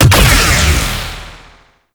Sentient Marrow Tick Death Explosion; Warframe; Tau

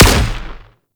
Anti Aircraft Gun Shot B; Weapons; Warframe; Archwing

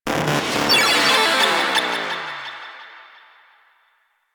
Arcade Fail; Warframe; Nineteeen Ninety Nine; Ninety Nine Snake Game

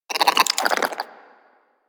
Vocal Idle, Hunting Critters; Warframe; Cryptilex

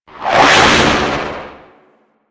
Space Pod Random Thrust A, Vehicles; Grineer; Warframe

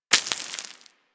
Bullet Impact Debris Dirt; Warframe; Weapons

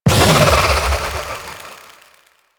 Fairy Light Impact; DE; Warframe

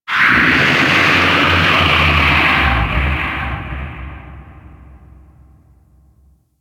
Ocean Kraken Bellow; Ambience; Warframe; Grineer Ocean

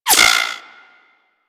Bullet Whizzby; Warframe; Weapons

